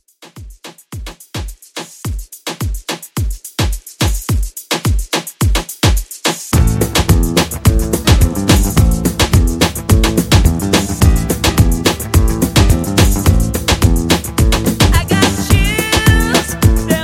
70s Pop Moombah Re Drum

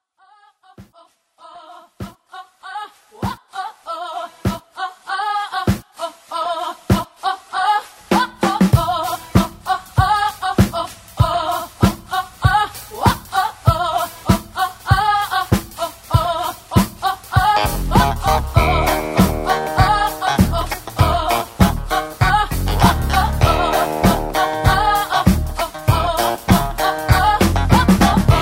Acapella Intro

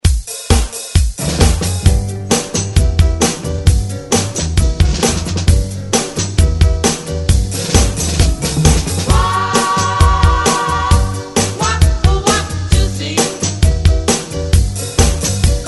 60s ReDrum 132Bpm; DJDOLLS

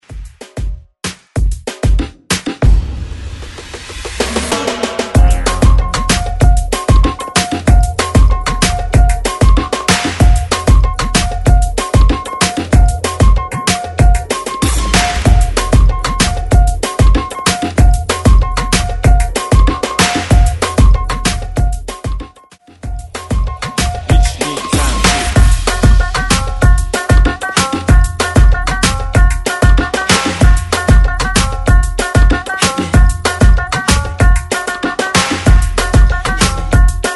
moombah redrums

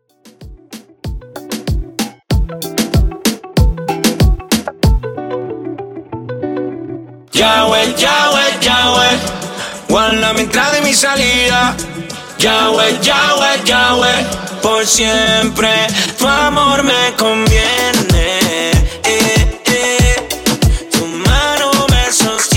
Reggaeton 95Bpm

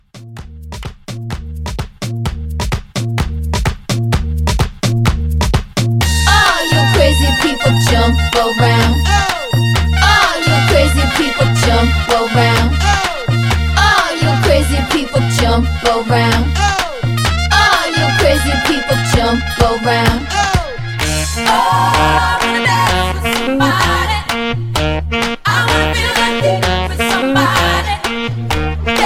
Quick Party Break 128

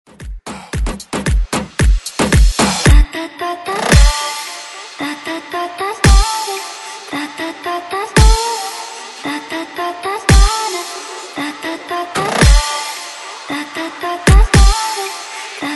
moombah redrums

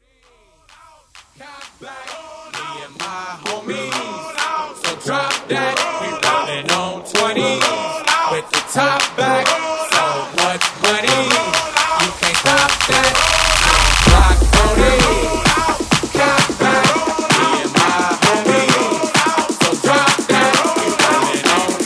Clap A Pella Intro Edit

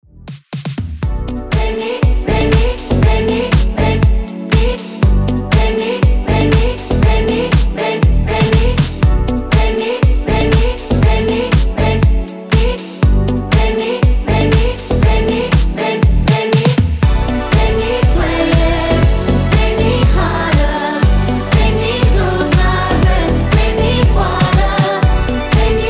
120 bpm

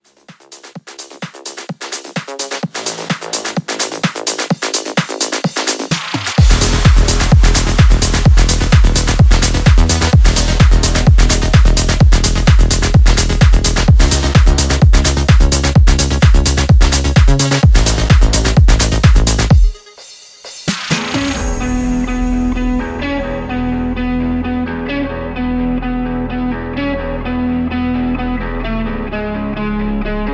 Pop Intro; Remixfreakz

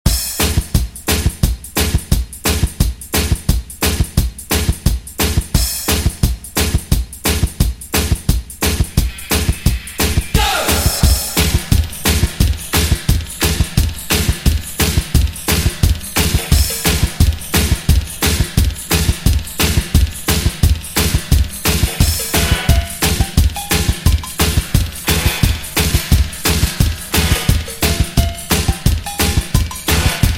Redrum 175Bpm

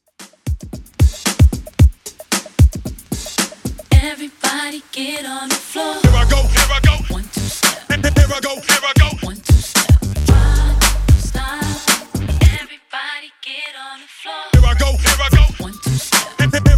113bpm; 2K21 Mixshow Edit

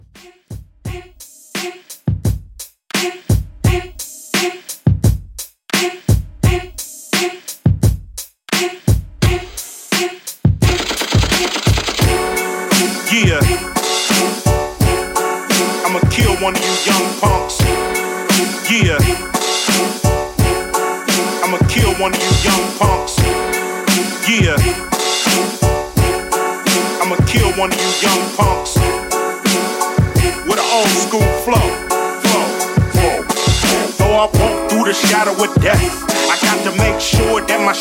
HipHop Redrum 86Bpm

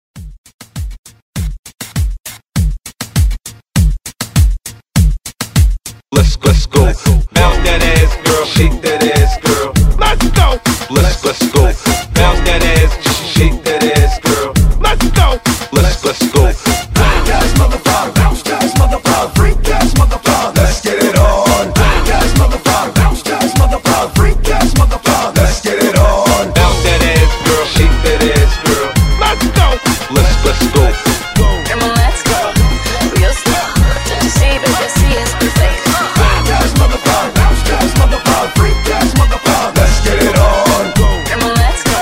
Throwback Reggaeton Hype 100

